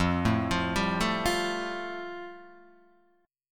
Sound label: F Major Add 9th, Guitar Open D